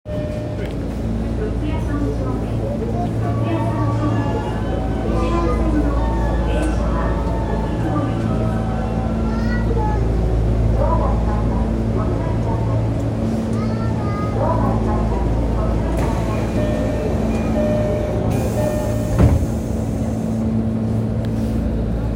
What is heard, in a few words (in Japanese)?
丸ノ内線四谷三丁目, train sounds